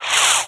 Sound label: weapon whiz by, zings; Spearhead Demo